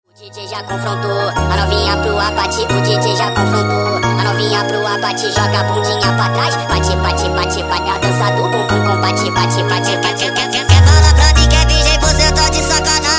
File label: sped up, funk